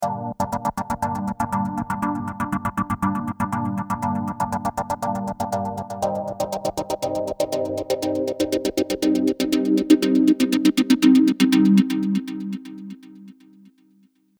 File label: lush chord; Lush JD Gator